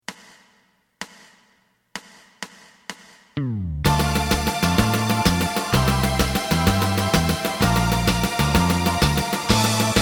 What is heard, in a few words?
lead